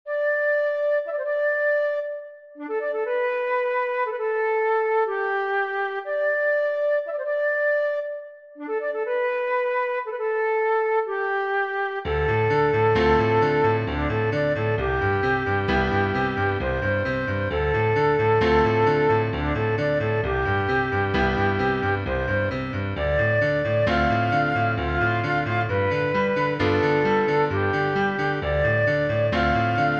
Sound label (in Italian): DUO PER FLAUTO E PIANOFORTE